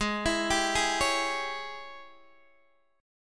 Ab Major 7th Suspended 4th Sharp 5th, Guitar, DADGAD; x,11,14,12,11,11; down